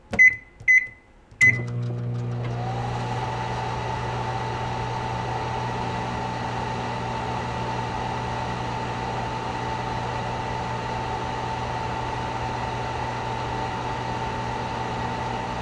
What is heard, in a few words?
microwave oven